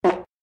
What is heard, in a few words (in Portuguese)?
peido